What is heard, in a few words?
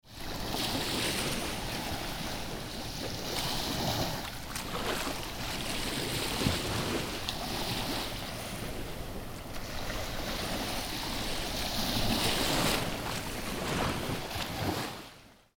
PARADISE SANTA MARTA amb